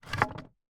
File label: rotate